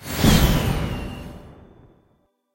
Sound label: jackpot Wheel Stop; irish pot luck